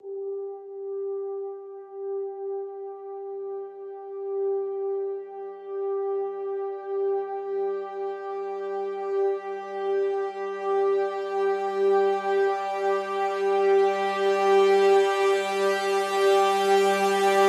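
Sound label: Crescendo Brass Swell Hi (G)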